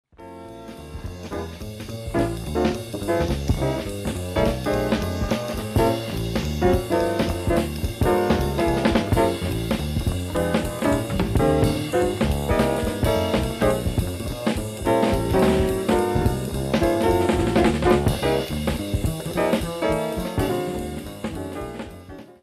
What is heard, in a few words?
fragment, altosax